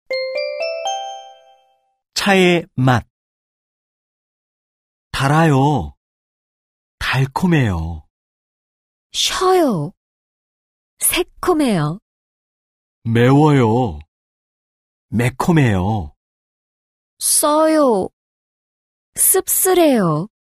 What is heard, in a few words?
K-POP